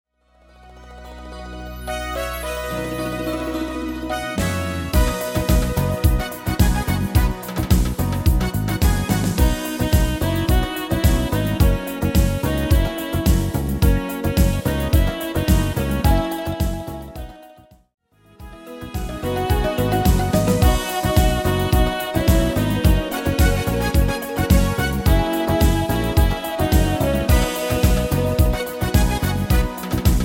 Samba-Fox

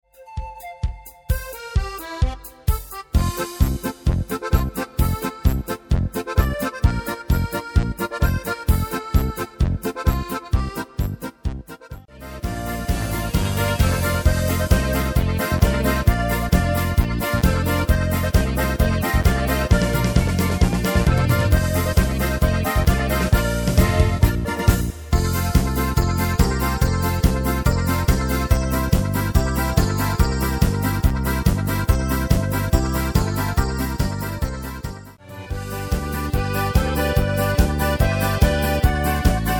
Polka Kracher

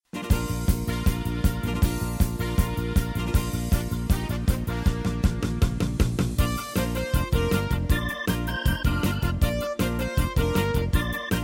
Schlager Revue

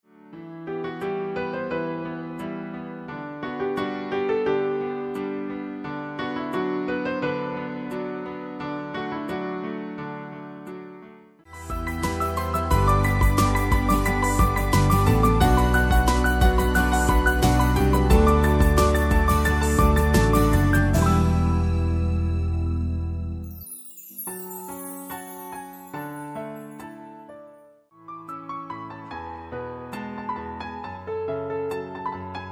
Piano Traeume

